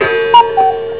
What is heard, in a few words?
bell and cuckoo, cuckoo clock